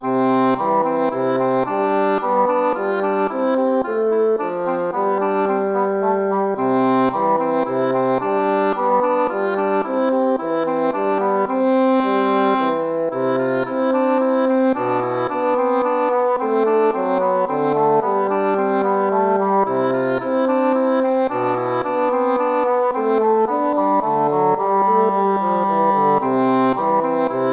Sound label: tempo minuetto; hook james